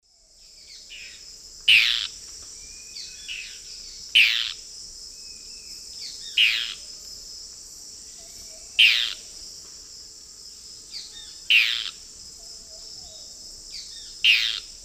Thamnomanes ardesiacus, calls and flight call, S. America Eastern Lowlands